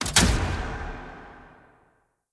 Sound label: Spotlight; blue archive